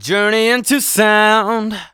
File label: vocals sung F#; Techno Trance Essentials